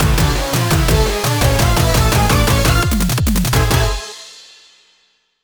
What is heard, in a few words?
Gameover; bullet soul